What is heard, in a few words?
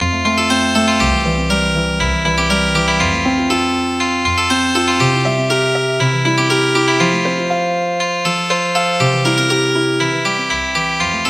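Healing Harp, traditional; Samba lele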